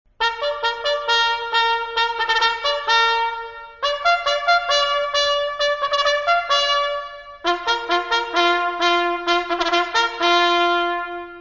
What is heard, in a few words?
Bugle Call Mess Call; armi